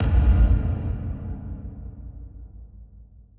Impact Thud Fast

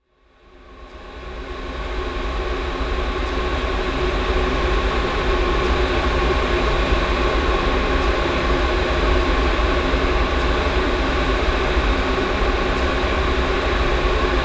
Athmospheres

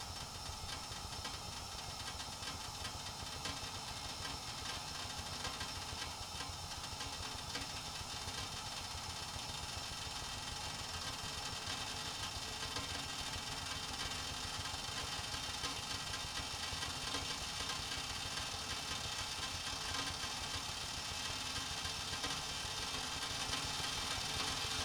Tin Tea Pot Boiling; Cooking

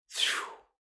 Human Whistle Long; Human Whooshes

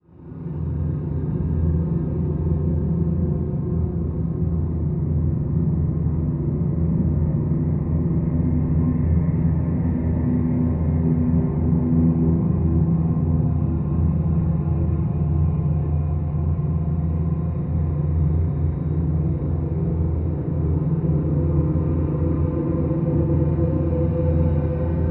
Drone

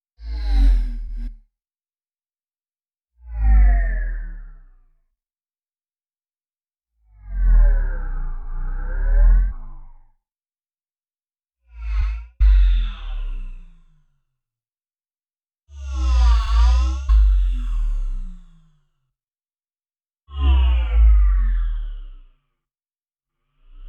Impact High Periodic; Alient

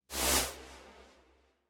Trembling Swish Fast; Whooshes

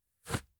Shoelaces, Clothes

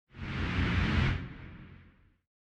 Rising Rebound Swish Long; Whooshes

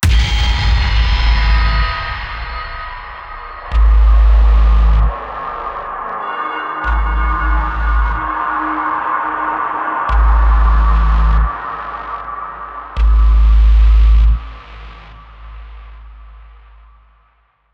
Tension Builder Impact

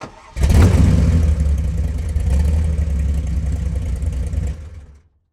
Vintage Car Engine Starts; Cars

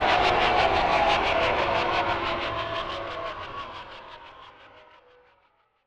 Dark Element Stab; Horror

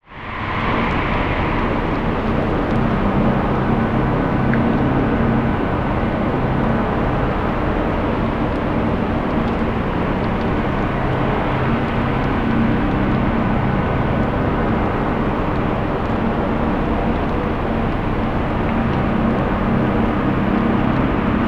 Digital Ambience; Cinematic

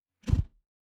Punch Swoosh; Hit Whooshes

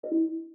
Cursor Click, Sci-Fi

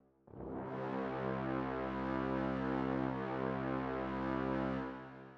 Dramatic Brass

